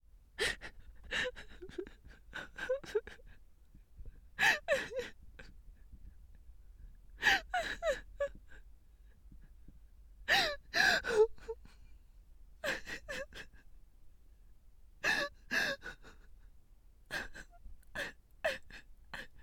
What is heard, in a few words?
Female Crying